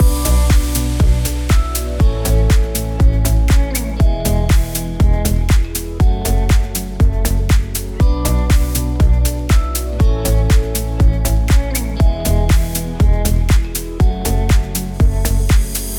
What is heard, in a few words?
Full Mix